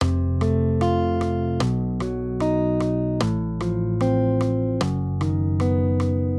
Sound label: maj triads; F-B; accompaniment